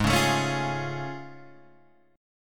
A Augmented Major 7th Ab

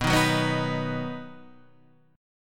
D sharp Minor Sharp 5th B, Guitar

